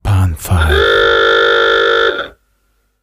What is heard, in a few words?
klaxon